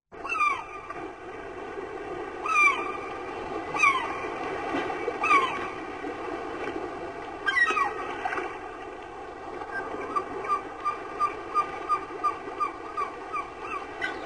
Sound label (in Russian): krik chajki na fone blizkih i shumnyh paplyvov voln